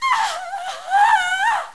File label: die, headcrab; svencoop; schall